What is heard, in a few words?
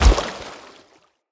Step Water, Animals, Foley, Steps; Bromius